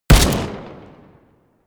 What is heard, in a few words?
rifle close shot; comedy-cartoon; pistolen-gewehre